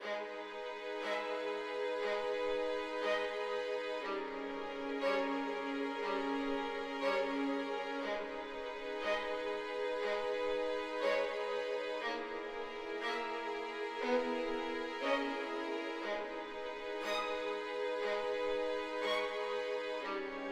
Orchestral Strings Waiting Building, Cinematic Trailer Loops